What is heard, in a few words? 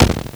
FM Crack, MultiMoog